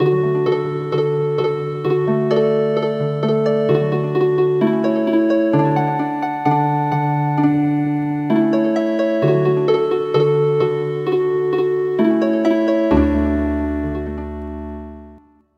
Healin Harp

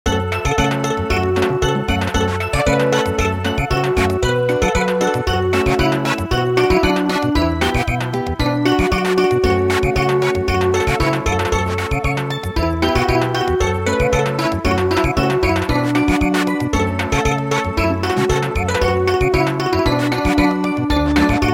Samba

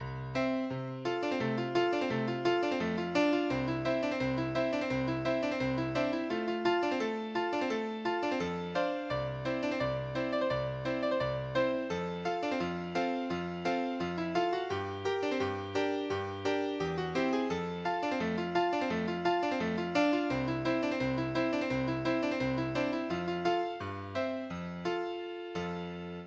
Walzer ragtime

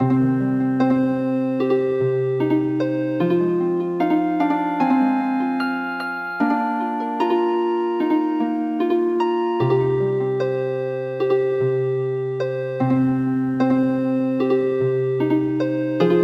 Healin Harp; Here comes the New Year

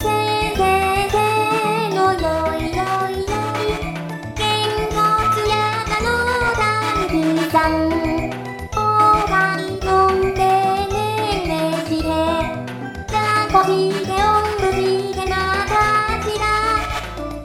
Raccoon Dog, traditional vocal; 童謡・唱歌; 鏡音リン

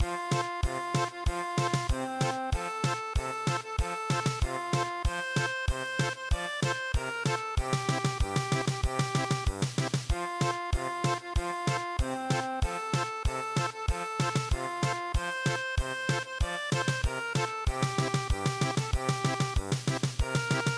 March, German Polka

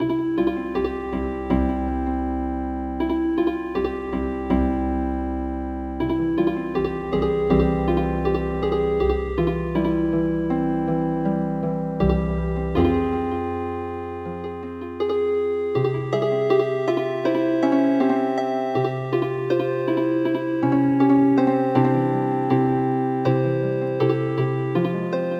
Healin Harp

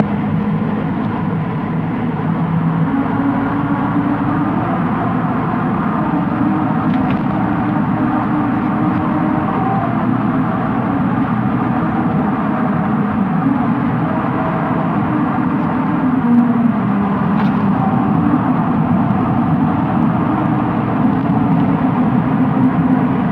melody, soundscape

